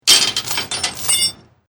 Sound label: Chain Metal Clanging